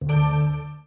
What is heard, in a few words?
collide; homespace